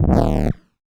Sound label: Drums; Distored Reality